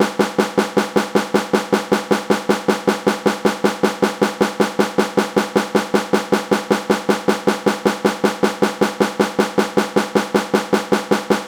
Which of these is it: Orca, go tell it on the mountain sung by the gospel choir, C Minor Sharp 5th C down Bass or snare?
snare